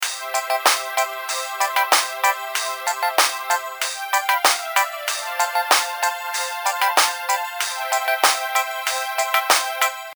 Games Muzak; Postal 2 Paradise Lost

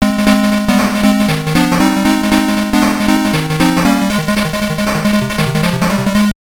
Level Completed & You Win!; Faceball 2000; Game Gear

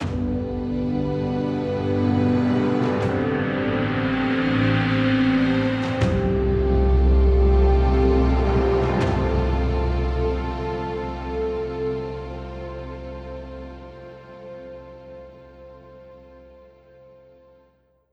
Level Up ORCHESTRA; rising islands windows gamerip 2016

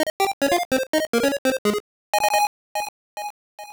Tiger Selected

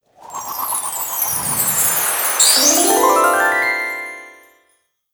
Dress Clothing Added; Dress Tiana Clothing Added

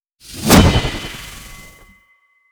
Special Bone Crusher; LooterKings

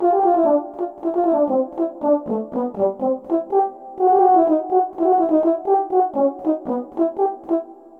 Tuba; Smeshariki Chiko's Computer

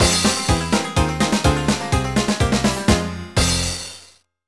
Sting sad; Wacky Races Crash Dash

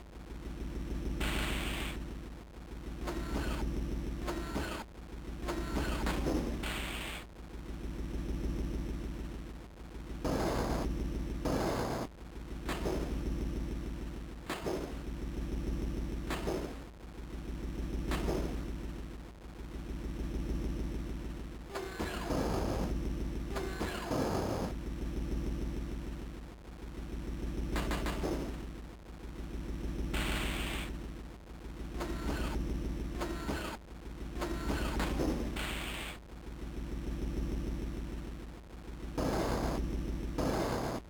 Ambience; Lilo & Stitch; GBA

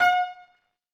Beat

